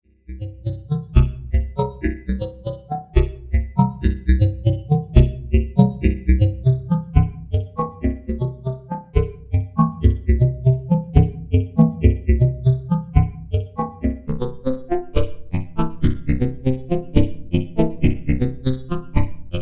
Sonicware ELZ 1 8Bit Wavemem Modulation PITCH DETUNE RATIO LEVEL